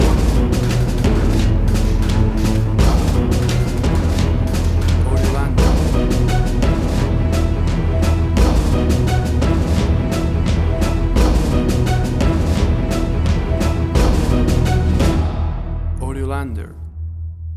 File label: Big Scary Action Trailer Strings